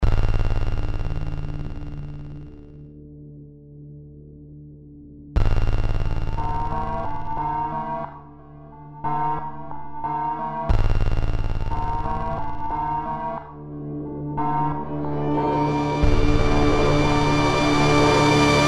Gameover Scene Subway

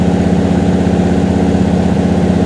Dodge Ram Low

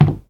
Wood soft; devour

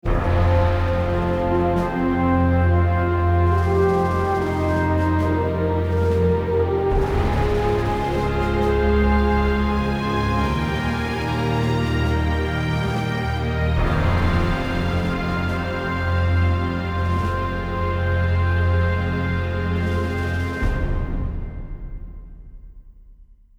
Minor Lose; battlestations midway

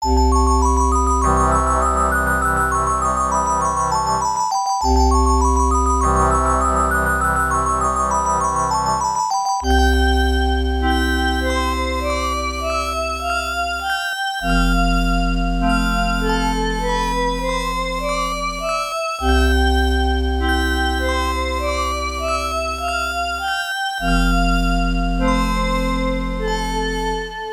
Relaxed Atmosphere; Lagrange Point

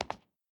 concrete footstep

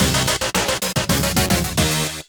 Jingle Mission Failed; bomberman 2; nintendo ds

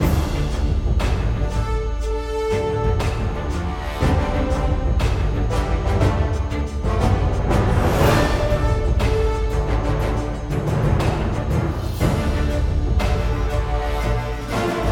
World Map Melody; crush your enemies

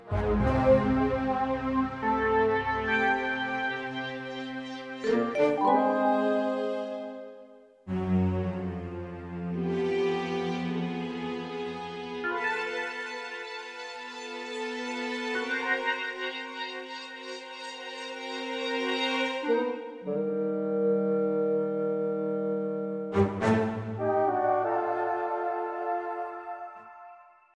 Suspense Music; winx club; ps2 windows

